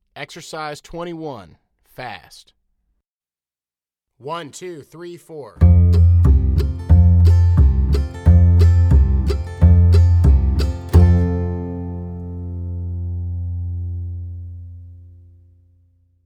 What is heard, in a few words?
Exercise Fast